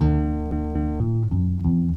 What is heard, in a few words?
bass, Loops Phonky